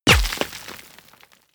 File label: bullet large impact dirt